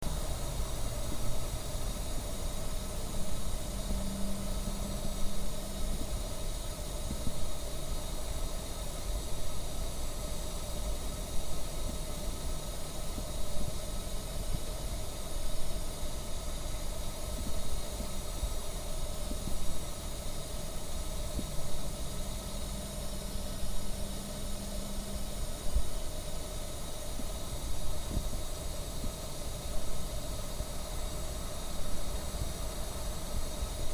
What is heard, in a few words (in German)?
gaslaterne